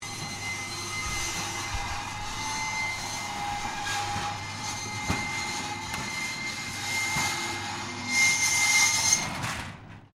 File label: Door Rollerdoor Medium Supermarket Closing Stereo, Medium sized internal roller; Miscellaneous Doors